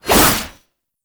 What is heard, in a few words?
Whoosh; Warframe; Melee; 1999 Skana